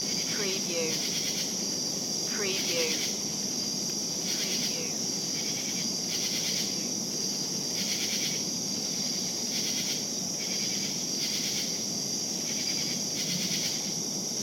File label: Night Crickets Savannah Loop